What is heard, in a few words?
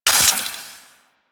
Quill Dart Gun Poison Mech; Warframe